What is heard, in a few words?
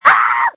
screams